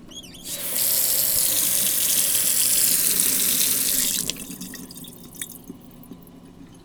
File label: Foley